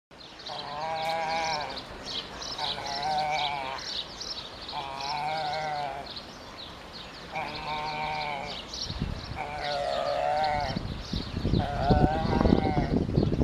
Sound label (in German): pelikan